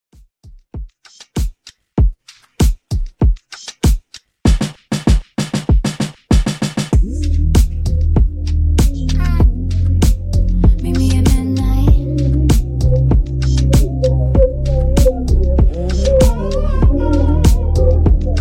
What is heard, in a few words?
Top40 Mixshow Intro 97 Bpm